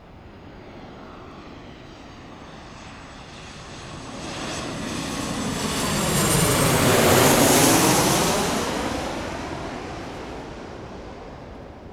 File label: a320 flyby; delta; fll